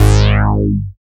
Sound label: BAS MKS-50, Roland